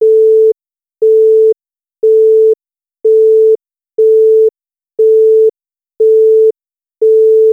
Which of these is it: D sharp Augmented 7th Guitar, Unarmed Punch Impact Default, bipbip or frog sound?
bipbip